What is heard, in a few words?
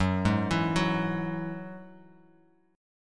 F sharp 7th Suspended 2nd F sharp down; F#7sus2 arpeggiated